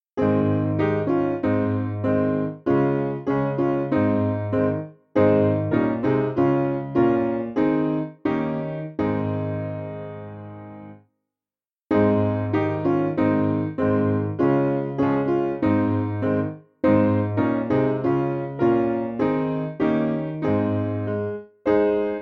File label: Piano